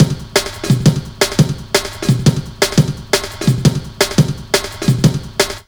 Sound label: 172 LOOP, Explosive Jungle; USB Soundscan vol.05; AKAI